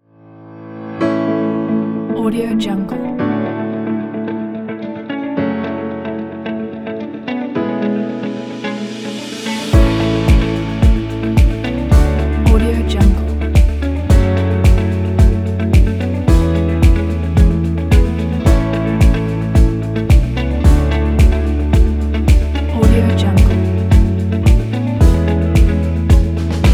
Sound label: hopeful inspiring positive corporate